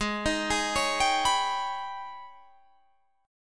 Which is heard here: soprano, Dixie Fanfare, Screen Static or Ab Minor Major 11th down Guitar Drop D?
Ab Minor Major 11th down Guitar Drop D